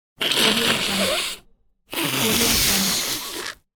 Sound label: runny nose